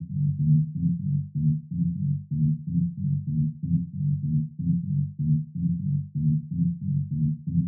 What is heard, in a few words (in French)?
basse boucle